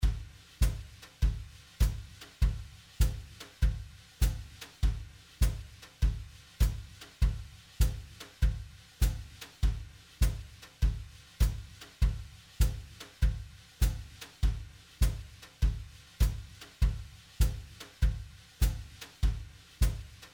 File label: jazz, ragtime